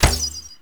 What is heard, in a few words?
Daikyu Prime Quick Fire Shot B; Tenno; Daikyu Bow